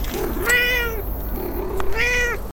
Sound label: cat meowing